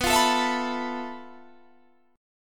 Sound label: F Major 7th Flat 5th B, guitar chord